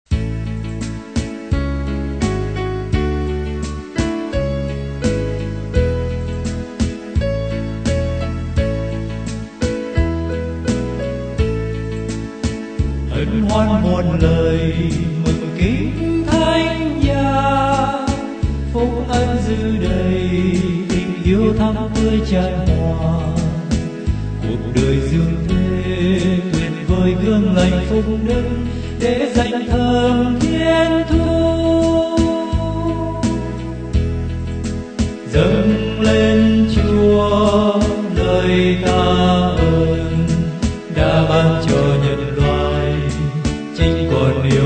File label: BASS